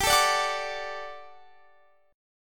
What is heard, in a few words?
G Major 7th Suspended 2nd